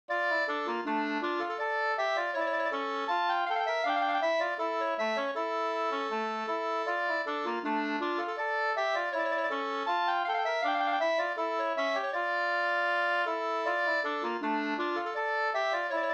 ganglat; Leksand

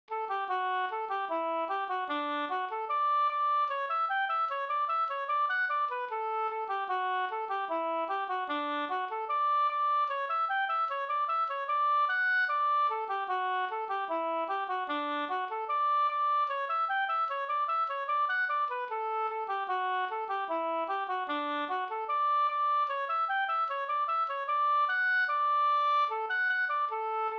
reinlender; e Per Munkberg SvL

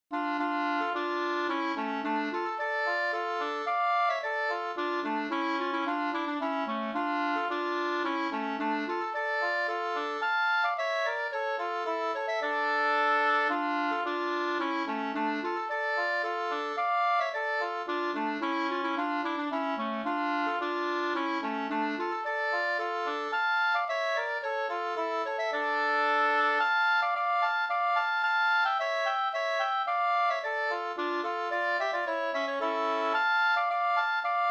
polska senpolska; Murklan; Carl Pettersson Mp

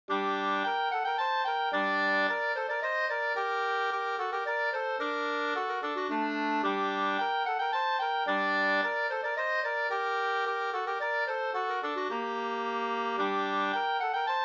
polska Bodapolska

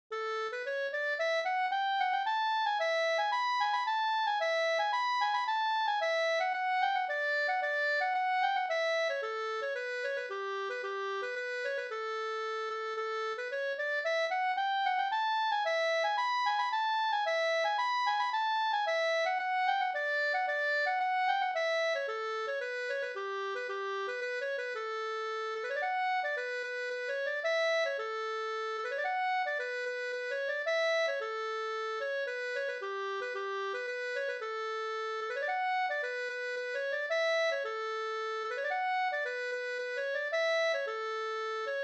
polska springdans

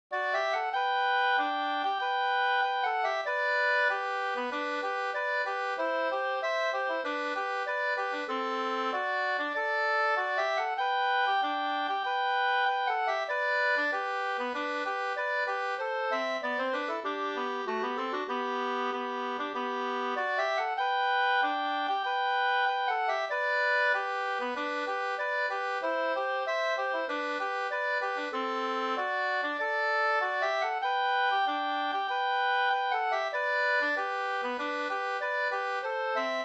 brudmarsch; fr Gudbrandsdalen

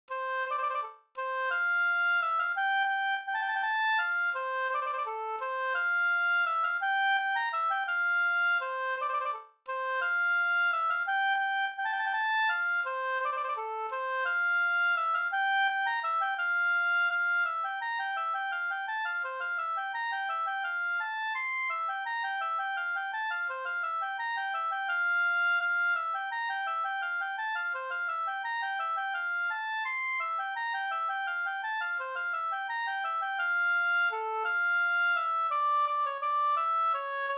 vals gammalvals

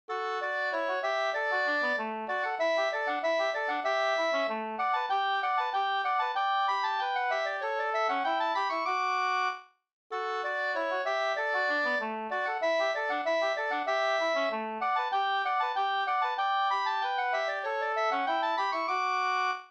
folkdans kadrilj D-dur

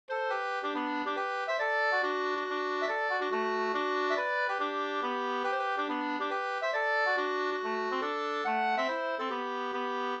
hambopolska

